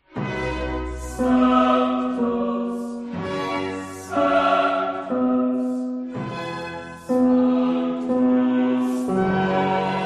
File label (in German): Sanctus Tenor, spatzenmesse